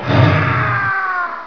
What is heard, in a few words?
die, halloween